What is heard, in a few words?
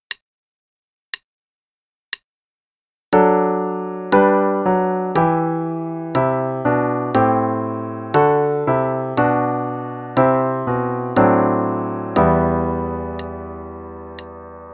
Play-along O Come, O Come Emmanuel (intro only, qn=60); Holidays; Christmas